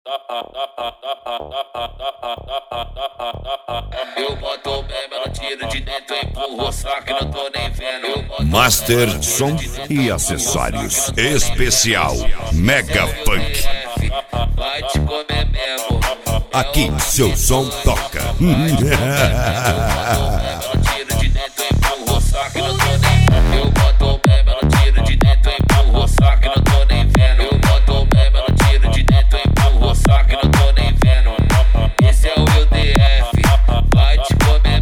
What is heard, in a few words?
mega funk; master som e acessorios